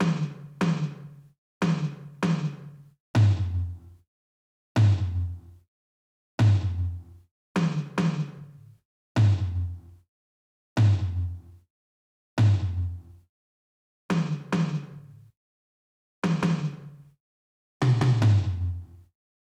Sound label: Ambient Toms